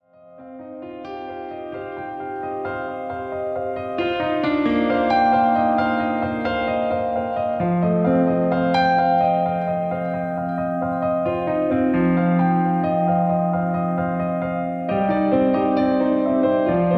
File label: Piano Piece